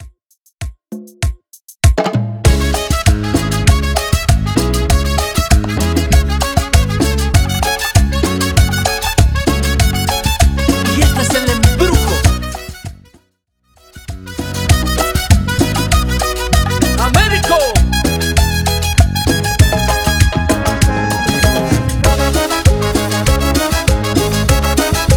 main 98 bpm; Latin music remixes

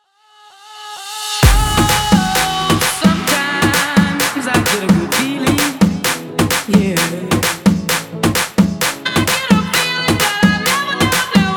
intro outro 130 bpm

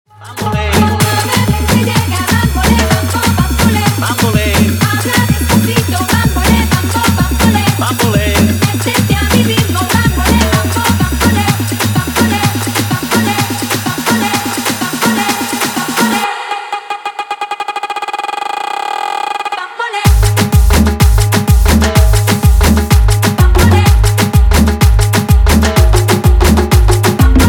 afro remix 126 bpm

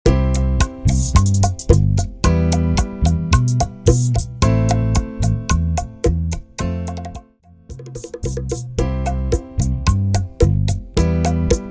Bachata 110 BPM; Bachata Project; bachata bootleg remix

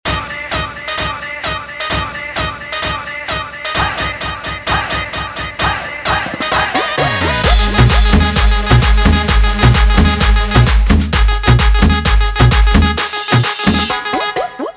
guaracha remix 130 bpm; Reggaeton dj remixes

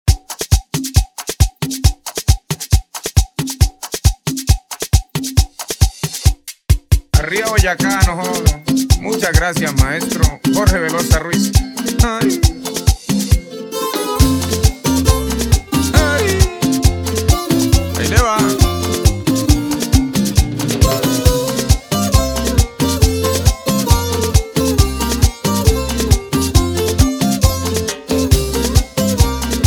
remix intro 136 BPM

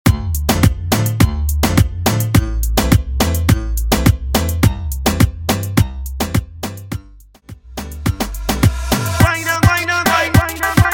Dancehall 105 bpm

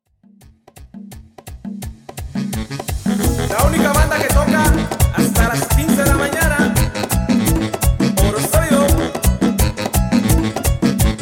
Intro Outro 170 BPM

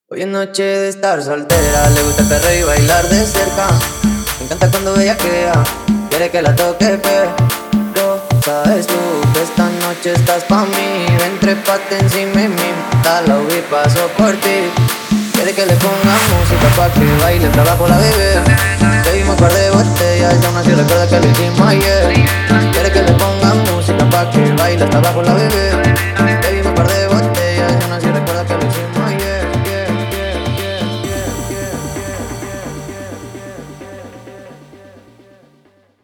intro outro 130 bpm

